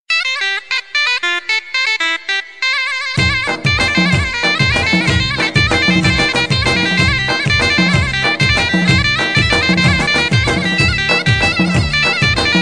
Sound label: Nokia Tamil; Ringtones